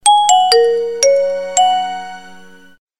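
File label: Start Up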